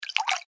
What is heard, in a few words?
water pour shallow, Water Poured Into Shallow Water; Pouring; Objects; McKinney Sound